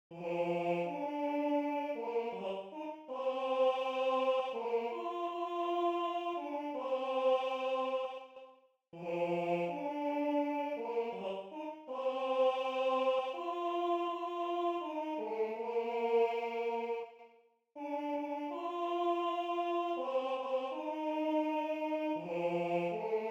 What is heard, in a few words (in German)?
Bauernmesse Kyrie Tenor I